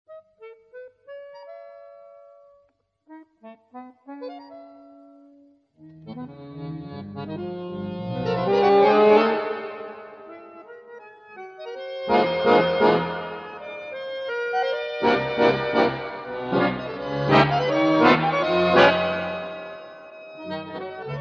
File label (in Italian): Scherzo